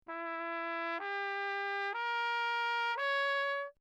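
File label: trumpet mute, Crown; Lechner; Perinétventiltrompete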